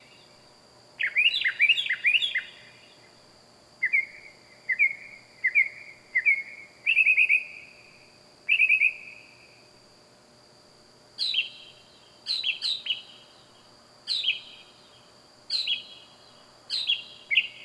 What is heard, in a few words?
Loud Night Bird Animals Wild